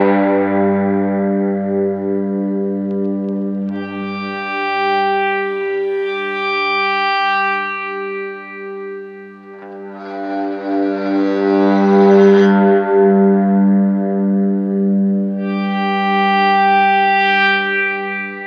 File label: Guitar Pluck and Bow G; Loops; Tonal; Ableton Live 12 Lite; Core Library